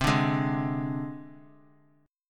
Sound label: C Suspended 2nd Sharp 5th, Csus2#5 chord